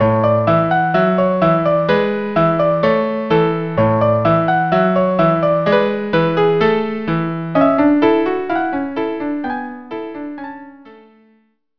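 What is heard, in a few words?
Halling violin cello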